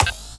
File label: puzzle connect, hideout gate